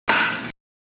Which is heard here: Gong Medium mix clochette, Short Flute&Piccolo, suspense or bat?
bat